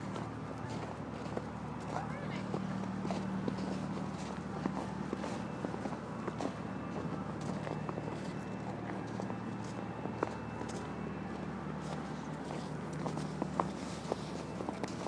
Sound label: Thames path with church bells; leading to London Bridge